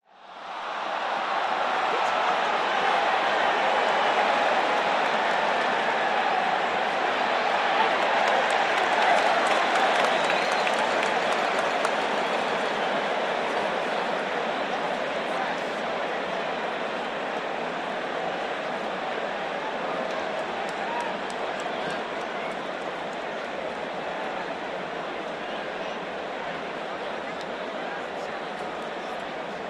Large crowd chatters with announcer in background during a pro football game in an outdoor stadium.; Cheer, Crowd Crowds, Stadium Football, Crowd